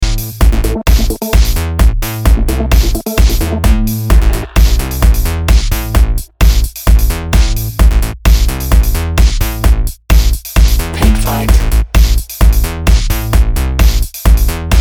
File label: industrial